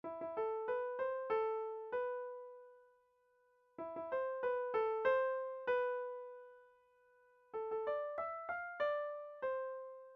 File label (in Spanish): composición para piano; Op.51; 2do mov